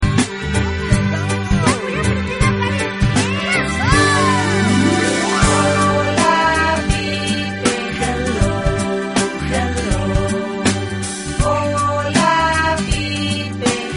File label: pipe